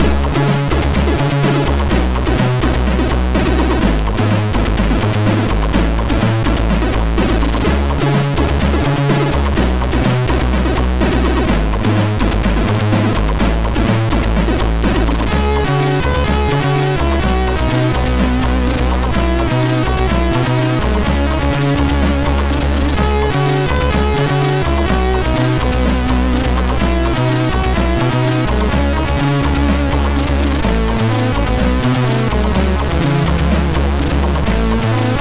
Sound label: sid MOS6581; Outrun II Remix; MOS6581R3